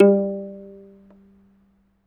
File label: AFRO HARP G4; AFR. HARP2; Heart of Africa